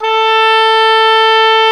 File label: OBOE, Orchestral Winds, Oboe mf f